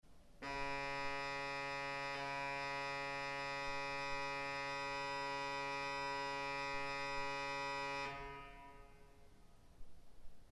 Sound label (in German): orgel; klagelied